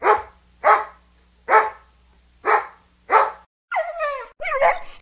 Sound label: Dog Bark Whimper; Zomboid 41.78.16; Project Zomboid